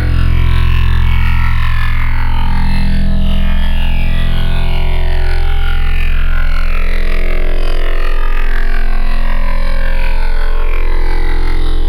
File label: Vocal Reese; Synthetic's Serum Collection